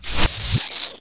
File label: syringe end; firefight